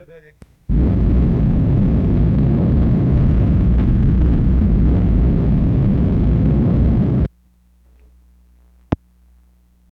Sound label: monstrous rocket roar